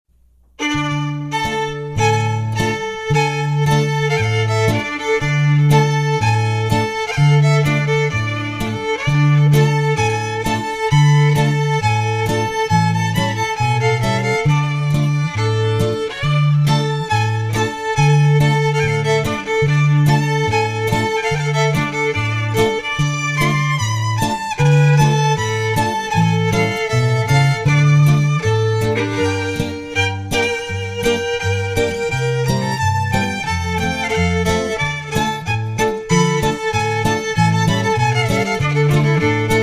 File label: INSTRUMENTAL UKRAINIAN MEDLEY